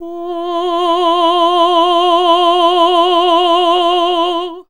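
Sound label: Classical Female F3, choir; Best Service Gigapack 1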